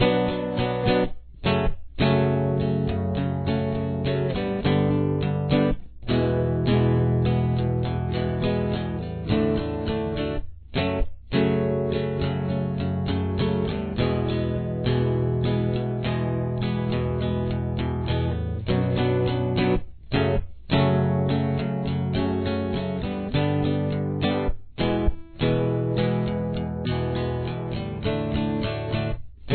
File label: chord theme, guitar